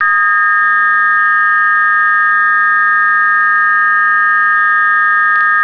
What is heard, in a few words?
signal